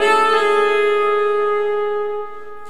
Sax Effect; Sonic Foundry (Sony Creative Software); Jazz Solos and Sections; Effected Sax

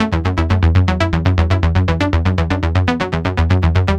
Progressive Synth, Trance

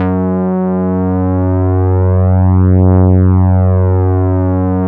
LEAD, Nord; Wizoo - Powered Nord; LEAD PAD HRN

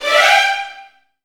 VN FX, Virtuoso Strings

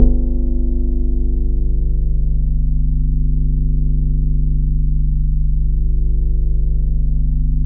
BAS G1, 2 Step; USB Soundscan vol.51; House Side Of 2 Step; AKAI; BASS

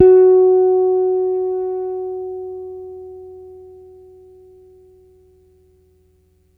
Gs F#5 hard, Bass, Pedulla Thunderbass; Giga Samples Collection

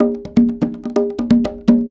Percussion Loops, Bongo & Conga; Houseworx

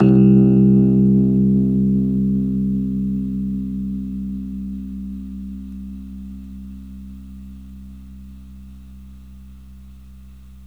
Rhodes, C; 3D Sound; 3D Keys VOL-1

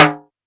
technox perc; Quasimidi Technox

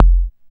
BD ANALOG, Synth; BDs; Sound & Vision - Gigapack II CD 2

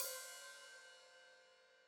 Hat Open, Hi-Hat; Cymbals Stereo; Deeply Sampled Drums

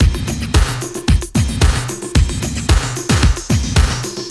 Loop, Roland; Sound & Vision - Gigapack I

